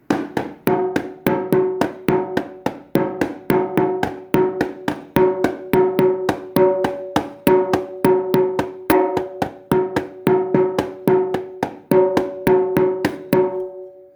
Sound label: paradiddle